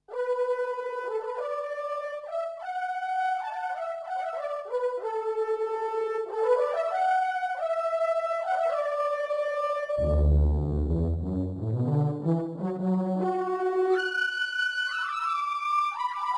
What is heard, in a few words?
Analookalike, A third traditional sound based exclusively on the ROMs saw, pulse and PWM waves; Korg 05R/W